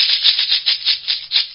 rattle, poison crab; svencoop; hl2

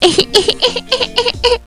cheer; svencoop; king

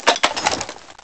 RPG reload; svencoop

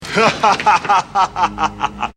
haha; svencoop; voice commands

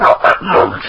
poison crab talk; svencoop; hl2

